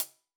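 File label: Sab Closed Hat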